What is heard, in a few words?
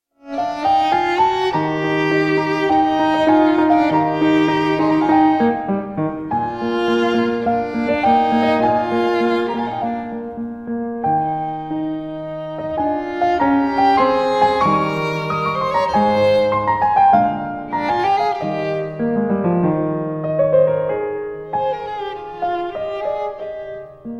violin sonata in g minor; Franz Schubert